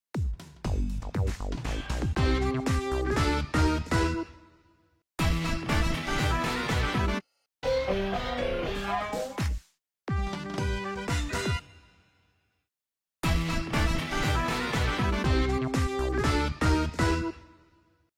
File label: Wario Jingles; WarioWare Gold